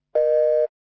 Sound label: OLD BUSY; skype